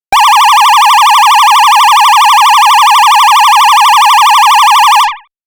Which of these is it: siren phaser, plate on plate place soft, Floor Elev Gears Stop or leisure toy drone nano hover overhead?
siren phaser